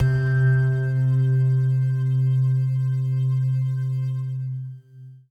Pad C, Synth Prophet One Shots; Electronisounds

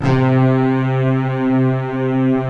SYNTH ORCH

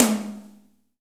TOM, Drum Dry; East Collexion; Drum 1

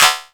clap, Korg Electribe ER-1

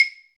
PRC XCLAV, Clave; Club-50 - Foundations Roland; Wood; Casta

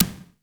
TECHNO PERCS; Crypton - DrumBase5000

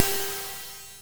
cymb, cymbals

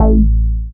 moog A; Techno Trance Essentials

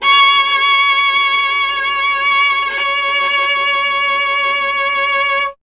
viola C#; Miroslav Vitous; Solo Instruments VOL-1

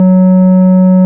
ORG, Farfisa

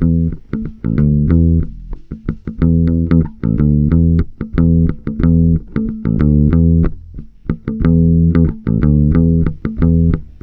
GRUNGY A, Bass Grooves; USB Soundscan vol.30; AKAI

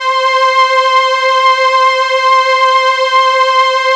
SIEL, String Machines

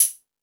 High Hat, Drum Machines E-MU Pro-Cussion

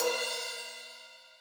crash, Millenium MPS-400

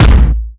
base drum; DRUM LIBRARY; DRUMS

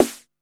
CITY, SNARES; Back In Time Records; Big Bang

